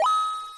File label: ROTATE; Bloxtris